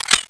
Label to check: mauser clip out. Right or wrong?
Right